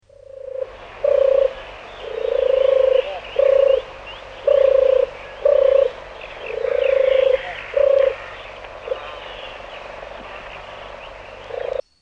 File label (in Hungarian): Vadgerle; Madarak